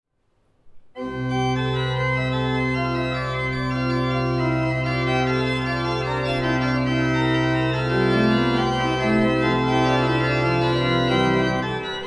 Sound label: C Dur, orgeln; bach; schmiechen